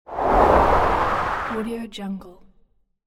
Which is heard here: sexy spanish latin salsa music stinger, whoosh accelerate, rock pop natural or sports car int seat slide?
whoosh accelerate